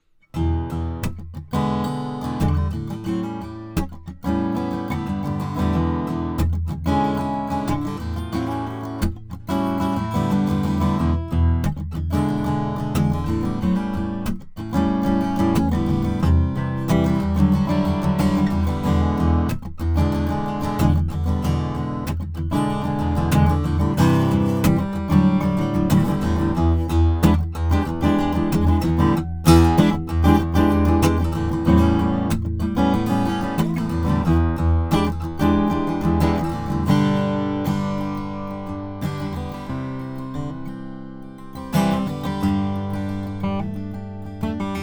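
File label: pellerin jumbo sunburst, guitars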